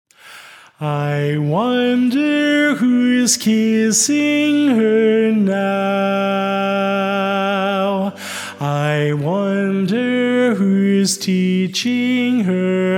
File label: Lead